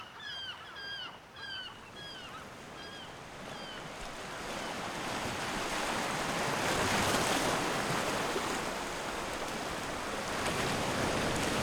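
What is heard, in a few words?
ebb tide, nature sounds; Zvuki prirody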